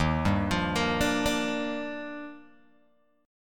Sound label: D sharp Major 9th, Guitar Open G; arpeggiated